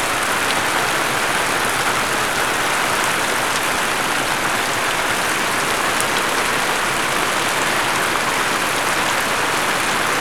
Amb Weather; Red Orchestra Ostfront 41-45

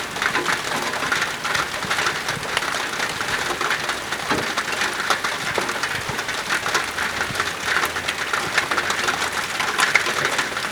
Amb Weather; Red Orchestra 41-45

